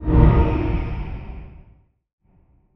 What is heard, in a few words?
UI Shadow Confirm; User Interface